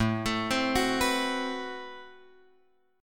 A Minor 6th Add 9th A, Chord; Am6add9 Chord {5 X 7 5 7 7} arpeggiated

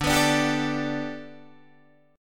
G Major Add 9th Guitar Standard, chord; Gadd9/D strummed; JGuitar

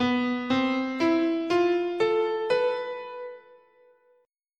B Iwato, scale

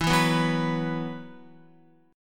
E Suspended 2nd, Esus2 chord, Guitar; strummed

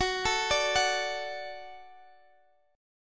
F sharp Minor Sharp 5th; chordshape; down

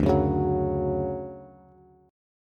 D Major 7th, Bass; strummed; JGuitar

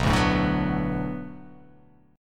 B Minor 7th B, chord; Bm7; strummed; x,2,0,2,2,1,1,x; JGuitar

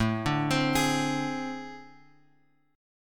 A Minor Major 7th Double Flat 5th; AmM7bb5 Chord {5 5 X 5 X 4} arpeggiated

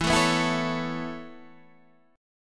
E Suspended 6th E

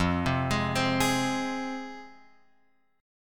F Minor, F, Guitar, Open F; Fm arpeggiated; x,0,0,3,3,3; JGuitar

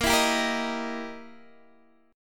E Minor Major 7th Flat 5th, A sharp

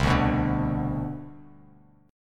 C Major 7th Suspended 2nd, C, down, Bass Standard; CM7sus2 strummed; JGuitar

